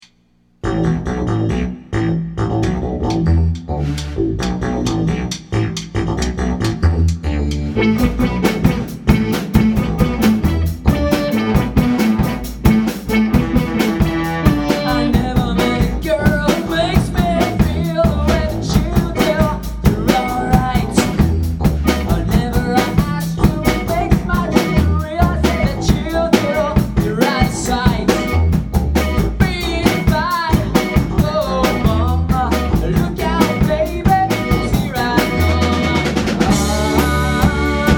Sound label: Techno; rockbandacademy